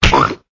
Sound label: Piggy Tap; Clickergame